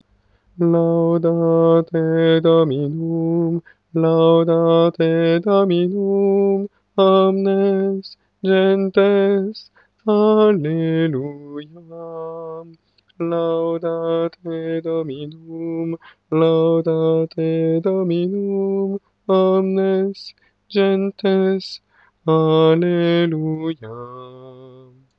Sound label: Laudate Dominum alto